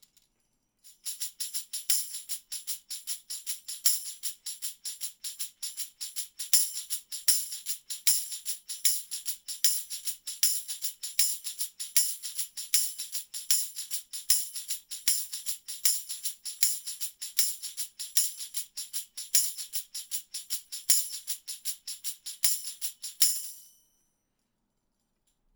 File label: Tambourine